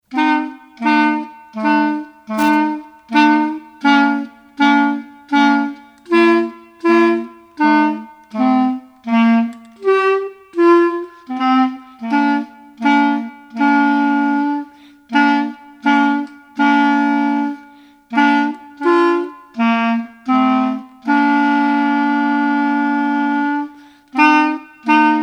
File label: Jingle Bells clarinet duet